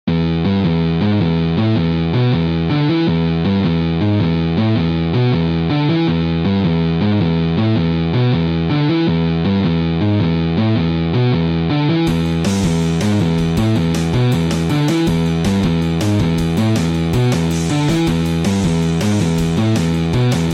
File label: AMPLITUBE FUNKK